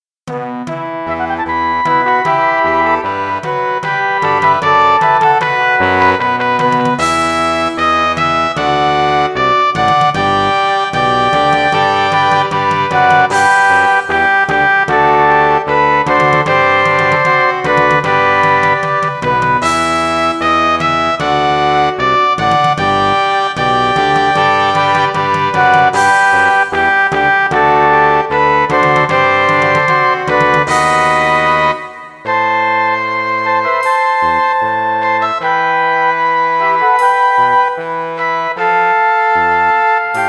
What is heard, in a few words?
BRASS; Brass Orchestra; Organo